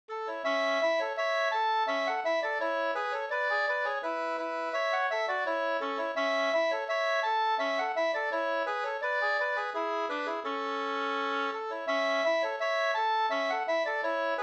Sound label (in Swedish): ganglat; e Skommarfar Siljansnas